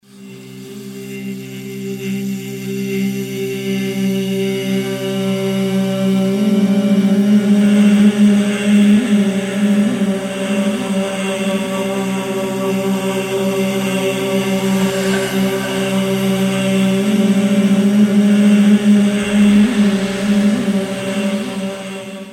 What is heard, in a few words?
Scary Chill Out